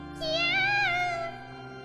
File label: kyyyaaaaaaa